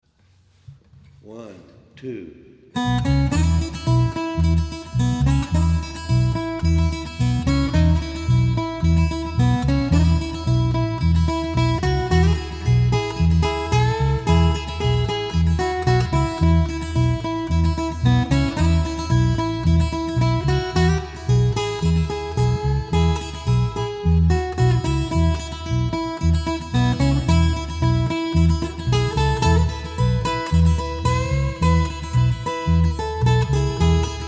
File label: Two String Diddly